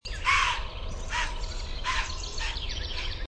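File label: White-tailed Deer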